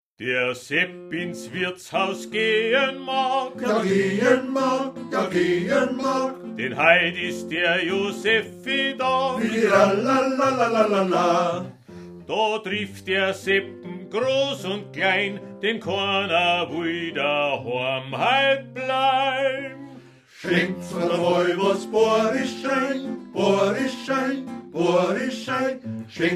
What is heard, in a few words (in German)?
Trommelverse